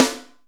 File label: Reverb Kick Snare; Percussion; Dance Synths